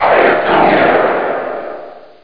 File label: growl; Hexen2; skullwiz